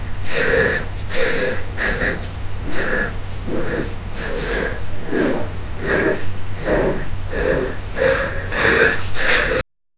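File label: a person panting rythmically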